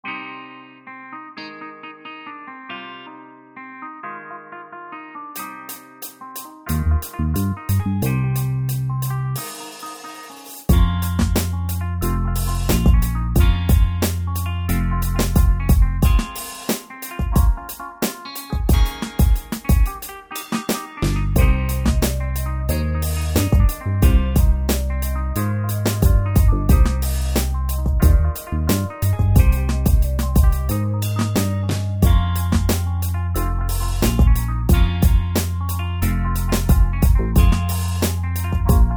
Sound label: guitar beat